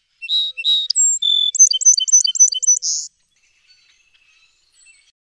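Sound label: Song Sparrow B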